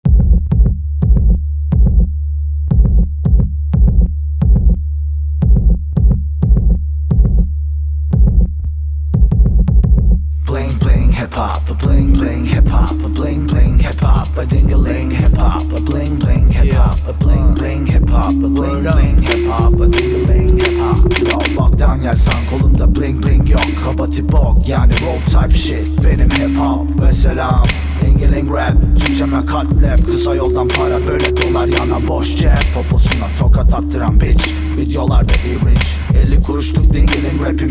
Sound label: bling bling hip hop